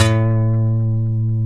B, funk guitars

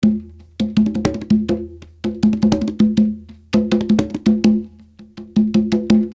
Live Perc Riffs; Jungle Frenzy 1

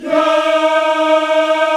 VOX African Yas; African Aahs; Roland LCDP11 Africa VOL-1

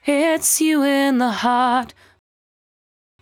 74-5bpm B, Velvet Vocal; While U Could; Zero-G

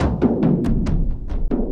Wak Wak Dang A Doonga, Frame Kendang Drums; Sonic Foundry (Sony Creative Software); Friendly Fire

